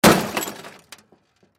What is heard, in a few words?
Impact Crash Object Metal Glass Plastic Hit Smash; Crashes (Sound Effects:Objects); Blastwave